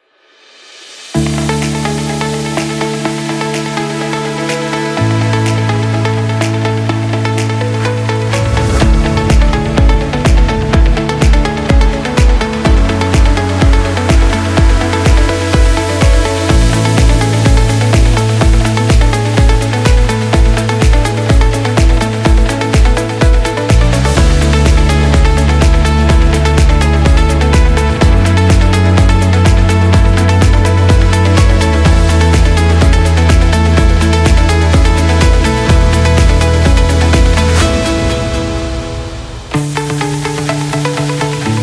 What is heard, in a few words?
Study